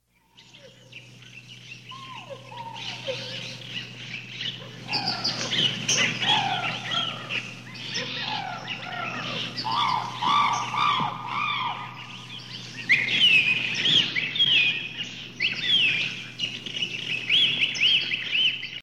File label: Birds, 3D Effect sounds